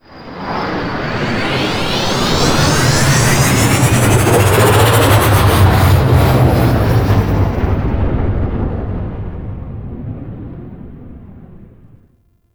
Ship Level Undocking; Vehicles; Warframe; Liset; Liset Flight; Grineer Ship Liset

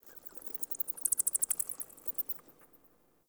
Insect Night; Warframe; Eidolon Plains; Ambience

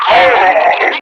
Enemy Death; Warframe; Lasrian; Battle Barks

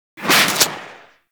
Crossbow Shot, Weapons; Warframe; Tenno; Garuda

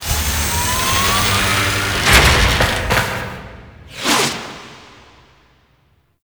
Ship Drop Off Undocking; Vehicles; Warframe; Liset; Liset Flight; Grineer Ship Liset

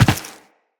Tenno Land Default, Footsteps; Characters

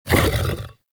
Melee Grunt; Enemies; Vocals; Warframe; TheNewWar; Archon; Amar

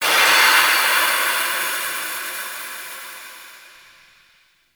Zariman Steam Blast; Warframe; The New War; Ambience

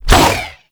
Pox Star Whoosh; Warframe; Infested Throwing Stars; Coda Pox

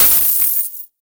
Fist Claw Coin Impact; Weapons; Warframe; Tenno; Incarnon

